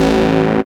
DR. LOOP, BUZZ; X-Static Goldmine 3

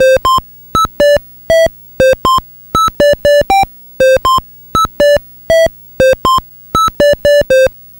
Atari 2600 Synth

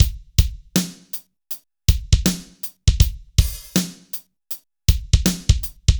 BPM 80 AMB; AKAI S-Series CD-ROM Sound Library VOL-4

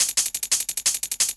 Shake 175, Drum&Bass Perc Loops; EZRollers

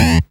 SYN OLD, Dance Synth; Sound & Vision - Gigapack I CD 2

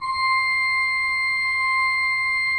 C5, Cathedral Organ; Propeller Island; ITAL.PRINZIP

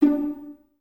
Violas D 4 Pizz; Miroslav Vitous; String Ensembles

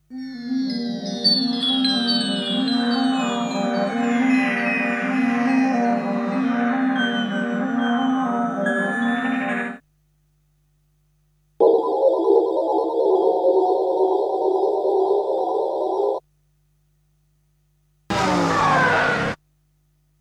Natural Effects; Kniteforce; 1000 Samples Volume 1

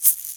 Egg Sk, EMU Orbit-3; 300 Drum Machines

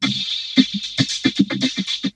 VOCO LOOP; Syntec; Wall of Sounds; TECHNO-BOX

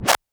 Shaker Rev Lo; Lost Tapes From Mars

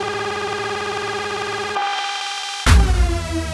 Hoover Build, EDM; Sonic Foundry (Sony Creative Software); Afterhours EDM; Session 10 - Come In Hard

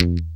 mute F1, strat; InVision Interactive; Lightware VOL-1; Instruments & Percussions

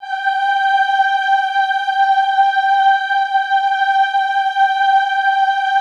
AHH G4 R, Classical Choir; Best Service; Extended Classical Choir; AHH LO+HI LL

